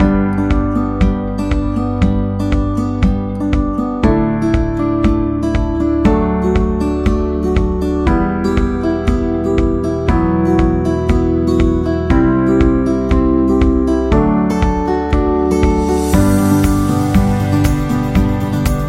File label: Acoustic Guitar Inspiration; wedding music